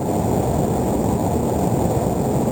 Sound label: static